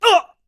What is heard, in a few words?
shove; video games; source engine; tf